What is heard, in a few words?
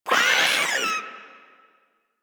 Maggot Bark; Enemies; Vocals; Warframe; Proto Infested